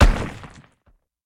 Obstacle Stonestatue; In Obstacle Stonestatue

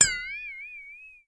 Gentle Dizzy Glass Bell; Frogsong

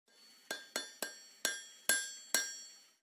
Blacksmith Add

